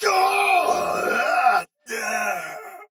Death Soldier Sword Agent Male, Battle; Sinecure

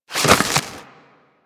Roll Ice; characters; generic foley; Warframe

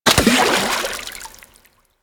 Fishing Spear Water Impact; Warframe; Weapons; Eidolon Fishing Spears

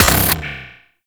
Shot; Warframe; Weapons; Corpus; Crp Charge Gun; Cycron

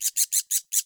Night Creature Close; Ambience; Night One Offs

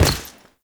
Step Default

